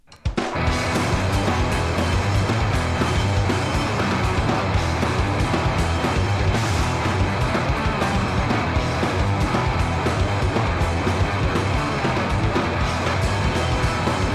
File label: RINGTONE